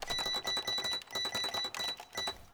keyboard; tardis2010

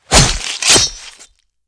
knife stab; garrysmod

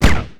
sniper silenced fire; gesource; weapons

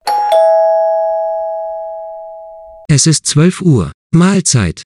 dingdong

